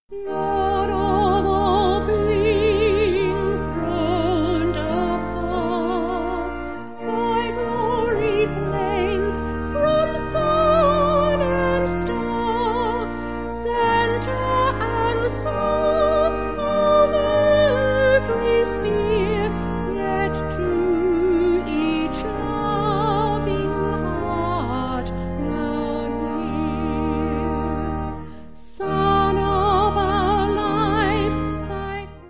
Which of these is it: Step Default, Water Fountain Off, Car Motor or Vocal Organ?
Vocal Organ